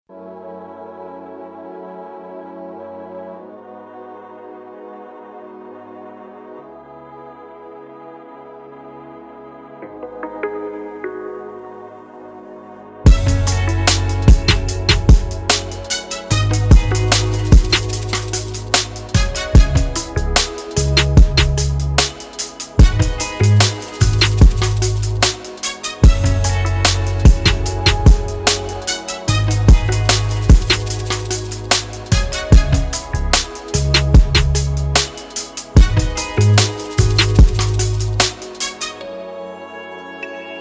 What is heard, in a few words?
Type Beat